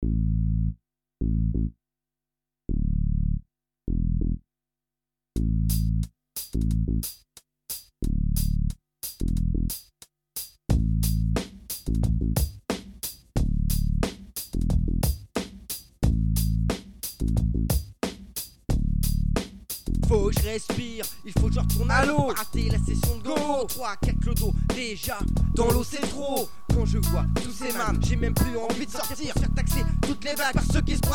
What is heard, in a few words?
Surf Rap